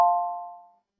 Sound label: alert, notifications